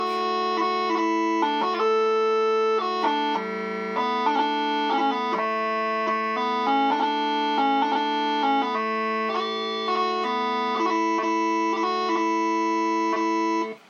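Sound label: bagpipe tunes; st patricks day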